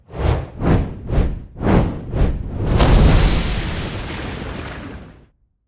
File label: random Feature Dragon Start Firepuffs; finn